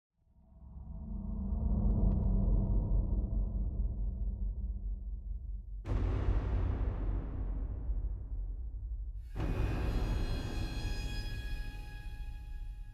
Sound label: String